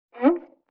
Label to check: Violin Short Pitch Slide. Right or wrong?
Right